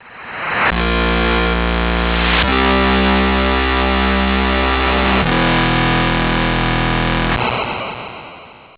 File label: tandy 3 voices